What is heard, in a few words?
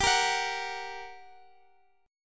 G Minor Major 7th G down Guitar Half Step Down